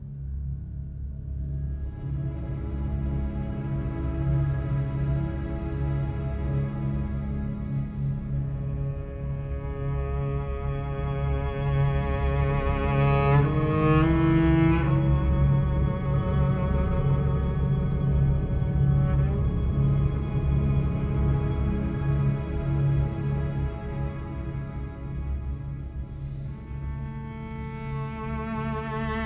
Music Menu; Battlefield 1

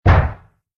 Dunk

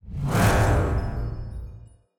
Attack Launch; portal quest android; Yoda

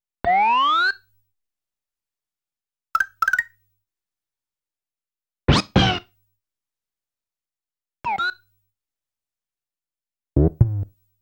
Grabbing Hold of a Balloon; super mario world live; Tango Breaking Time!; All Aboard Yoshi!; Yoshi's Tongue Attack

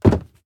Jump Wood Land

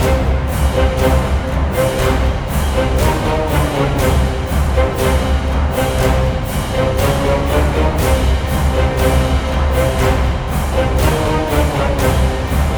Nature Loop; Jotun

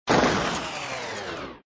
blender burnout; gamerip; Papa's Freezeria

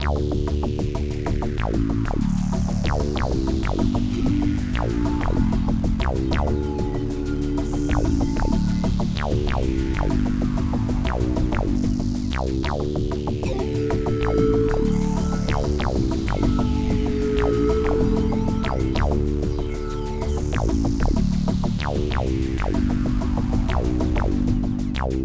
Alarm; Hard Truck Apocalypse: Rise of Clans; Ex Machina: Meridian 113

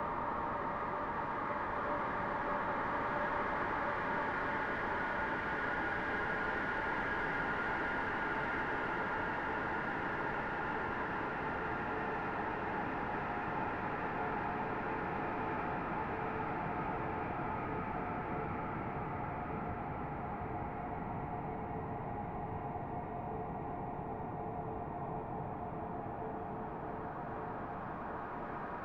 Tank Assault Pigeon Noise Loop

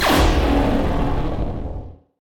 SFX - Space Warp Complex Stereo

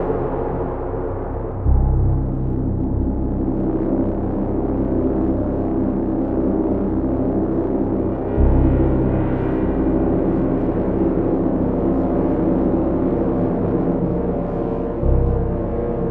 loop 72bpm

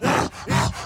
Enraged Breathing Loop; The Town Sam Clip Burns; Devour 2021 gamerip pc macos

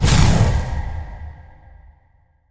light rune explosive start

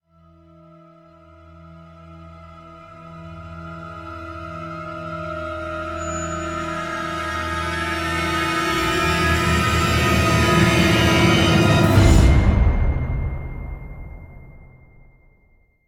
Danger Countdown Stinger

